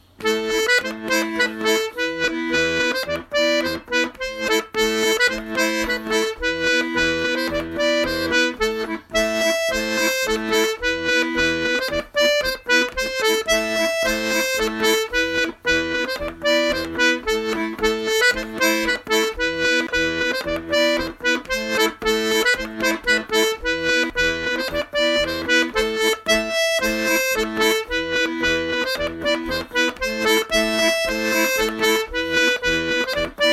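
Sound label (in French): polka de l'est, accordéon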